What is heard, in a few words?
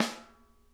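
center, snare; Sonic Reality; Interactive Drum Kits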